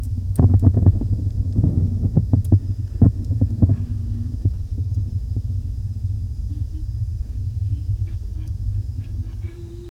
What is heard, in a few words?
wasteland nomad camp at d